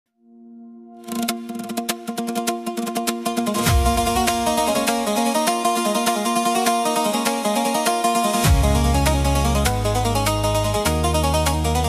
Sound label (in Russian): Golos Avarskih Gor Pod Zvuki Pandura